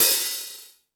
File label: Metal Drums, E-MU Pro-Cussion; E-MU Pro-Cussion-1